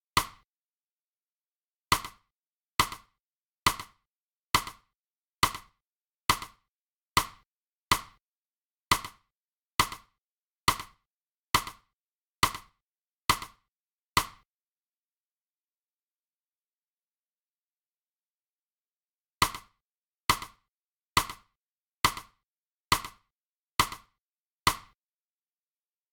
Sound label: side stick